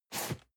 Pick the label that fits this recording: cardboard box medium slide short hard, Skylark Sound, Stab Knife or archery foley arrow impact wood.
cardboard box medium slide short hard